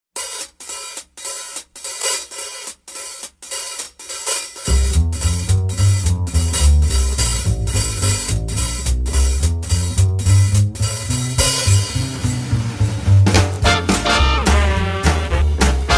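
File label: accompaniment slowed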